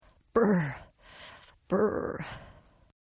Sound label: Shiver Female Brrr; Sickness Sounds; Partners In Rhyme